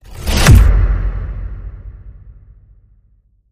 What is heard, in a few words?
Smash Whoosh Hit